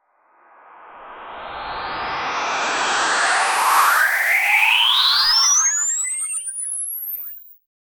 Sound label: rises motion